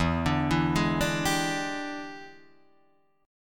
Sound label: E 7th Sharp 9th Guitar Open D, chord